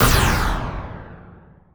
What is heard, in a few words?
laser fire; Quake 4 Alpha; id software